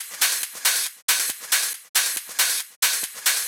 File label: Manhattan Kit 138BPM Loop, Drums & Perc; G#minor; Vengeance Samples